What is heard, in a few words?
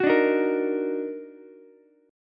Eb Minor Major 7th Eb down Ukulele, chord; EbmM7; strummed; JGuitar